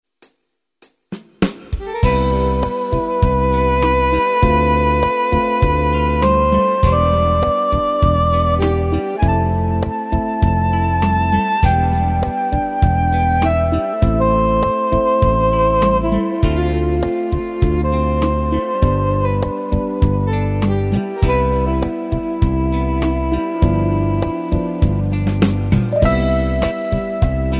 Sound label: Cool 8 Beat, Pop & Rock